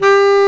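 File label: bass clarinet, Orchestral Winds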